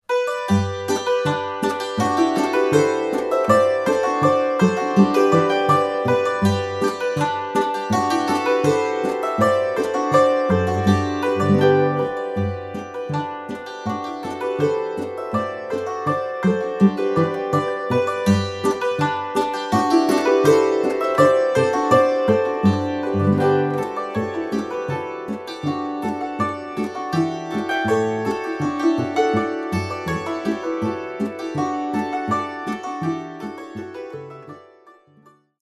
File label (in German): Polka